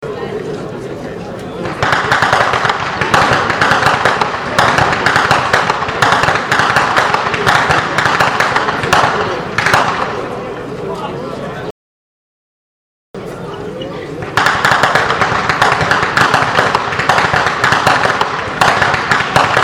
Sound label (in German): schnalzen; muntigl